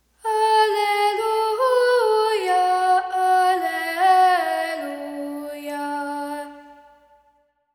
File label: alleluia alleluia